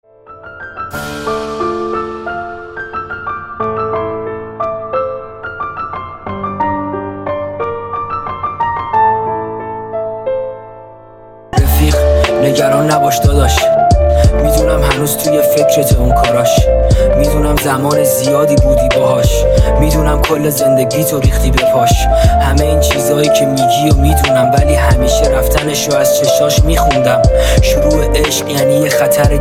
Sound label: Remix Rap